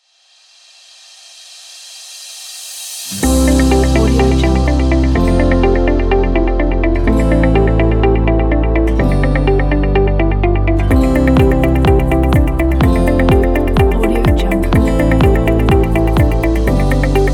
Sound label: inspiration corporate